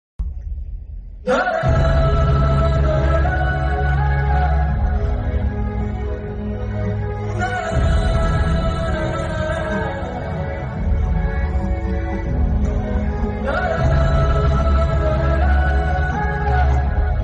tamil movie sad bgm

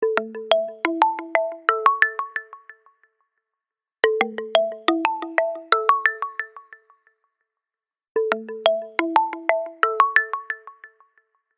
Samsung Original

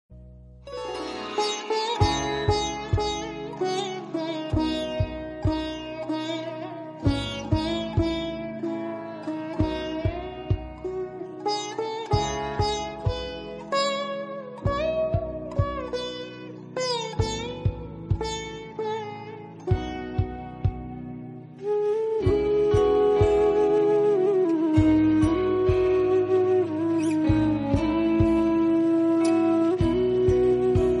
piano instrumental

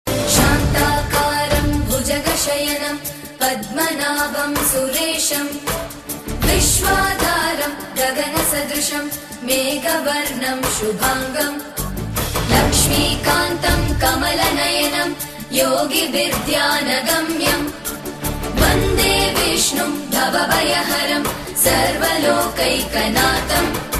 vishnu mantra

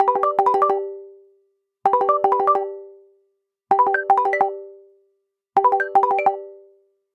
iPhone SE 2 ringtone

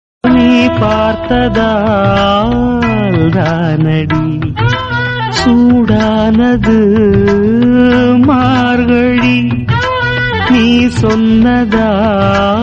tamil love feel

